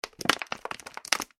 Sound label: bottle plastic movement; Plastic Bottle Handling Movement; Materials; Bottle (Plastic) Movements